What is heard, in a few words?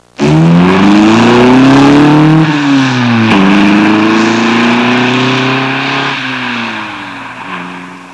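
sports car, Transport; Sound Library 2000; Wayzata Technology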